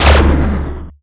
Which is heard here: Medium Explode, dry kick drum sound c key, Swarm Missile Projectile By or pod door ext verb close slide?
Medium Explode